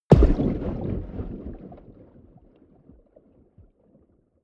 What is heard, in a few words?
underwater hard